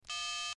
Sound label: Telephone; Cell Phone Number Key Beeps From One To Nine.